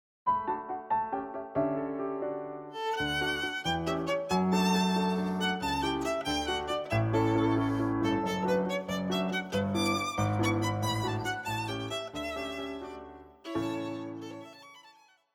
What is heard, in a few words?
Beethoven Violin Sonata; op12 no1 movt3; Violin Sonata No 1 Movt III